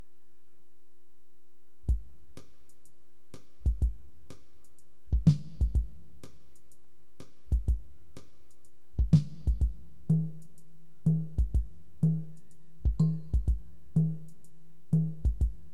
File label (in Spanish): bateria